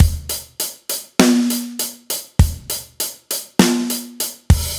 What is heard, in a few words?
Drums